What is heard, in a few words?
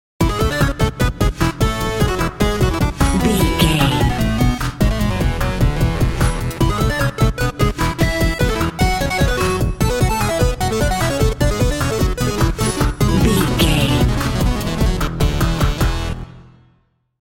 hero theme video game bumper